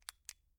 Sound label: plastic button